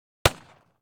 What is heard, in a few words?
gun hand gun; NCH Library